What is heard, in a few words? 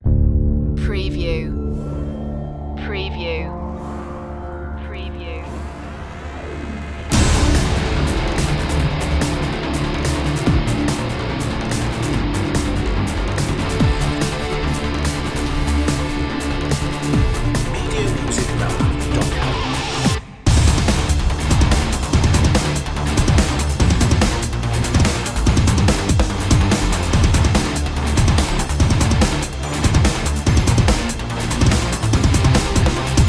arcade rock underscore